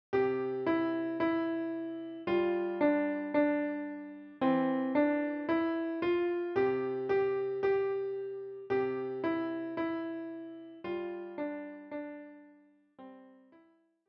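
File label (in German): Klavier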